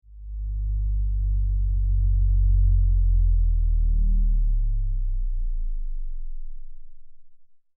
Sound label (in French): doigt peau